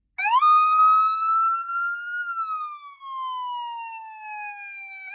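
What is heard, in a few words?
Wail, emergency vehicle safety warning; 911 Signal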